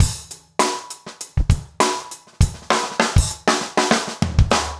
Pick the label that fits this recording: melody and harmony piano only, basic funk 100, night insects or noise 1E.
basic funk 100